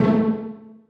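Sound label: celli pizzicato a2; Sonatina Symphonic Orchestra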